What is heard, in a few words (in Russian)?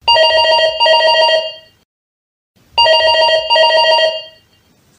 Звук домофона Цифрал; звуки, стандартные, уведомление, на уведомление, русские, на смс